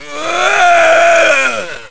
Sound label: Evil Grunt; Evil guy grunting